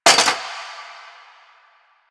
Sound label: Cafe Chair Short